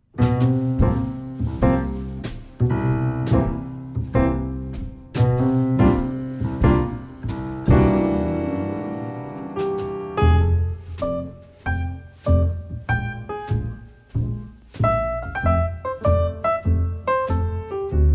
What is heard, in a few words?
Piano Jazz; old school jazz standards